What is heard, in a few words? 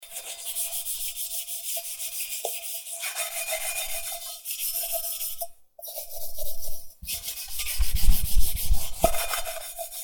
pot cleaning